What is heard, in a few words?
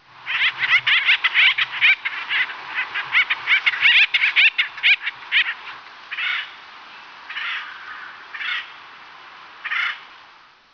acorn woodpecker